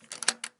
Open and close the lock

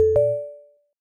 notification mobile

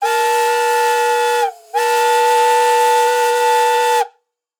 train horn

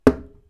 wood blow

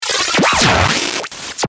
Laser and space gun

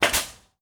air pistol

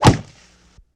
Throw punches and kicks

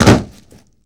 Falling from a height

